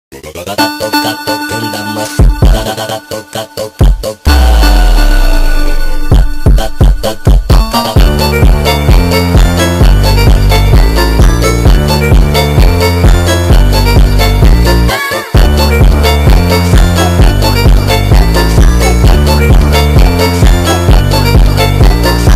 Brazilian Phonk

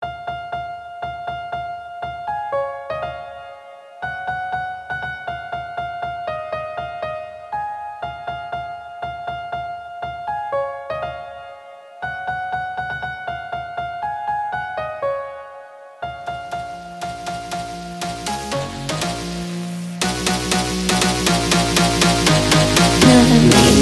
Jingle Bells Phonk

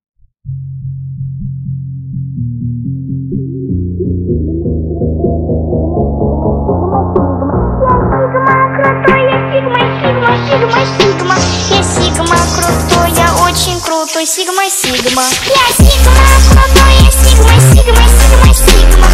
Phonk Remix

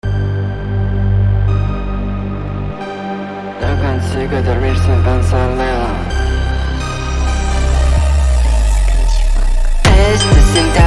SPACE GLITCH FUNK

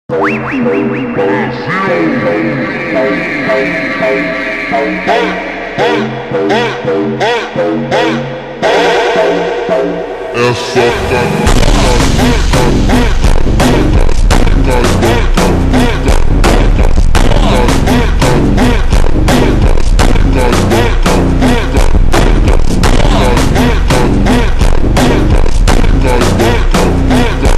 SUPER SLOWED REVERB BRAZILIAN PHONK

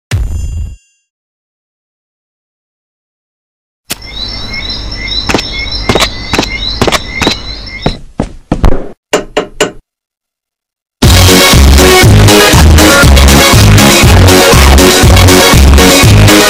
Mega Bass Boost

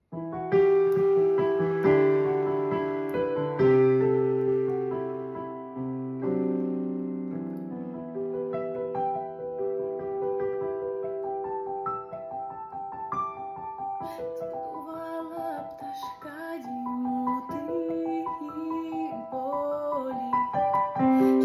Acoustic version

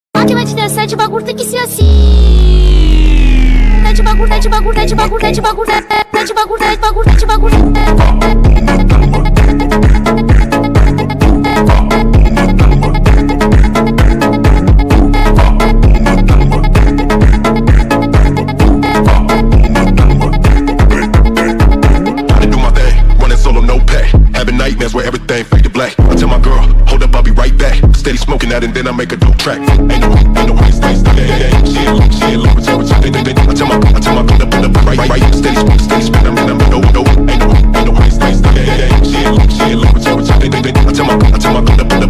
Brazilian Phonk

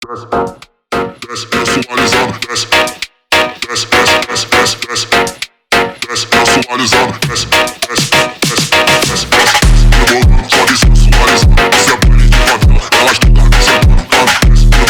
Brazilian Phonk

